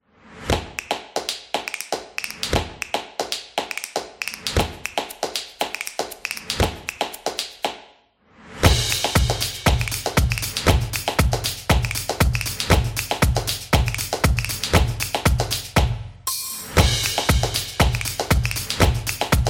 Agency Promo; event promo

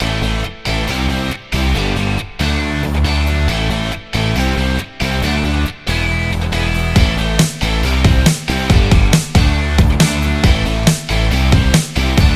Indie Rock

